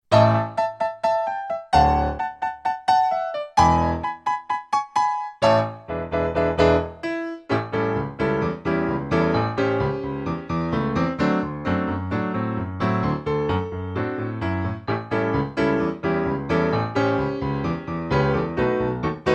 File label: music only